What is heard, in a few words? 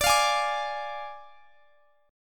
D Diminished D; Ddim strummed; x,x,24,22,21,22